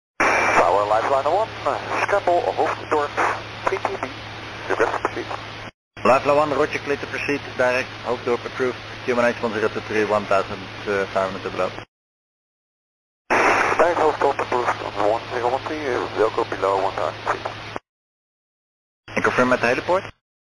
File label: scramble; hoofddorp